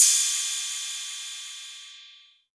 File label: 808 Cymbal; Novation DrumstationV1